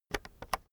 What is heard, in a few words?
keyboard external enter press slow soft